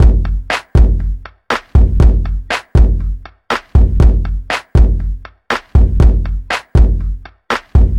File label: Dirty South 120 Bpm